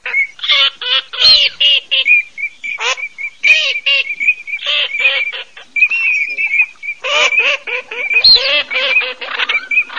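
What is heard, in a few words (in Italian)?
alzavole fischione maschio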